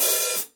signature 14 reflector heavy full hi-hat open, cymbales